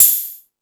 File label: High Hat, Drum Machines